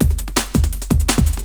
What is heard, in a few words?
break